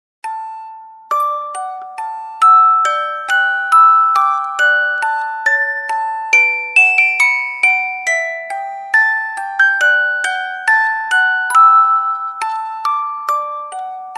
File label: Orgel; KIDS 귀여운